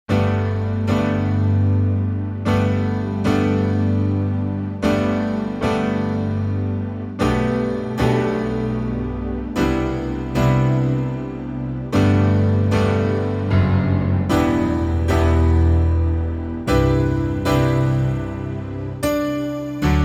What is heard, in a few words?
Accompaniment; Amazing Grace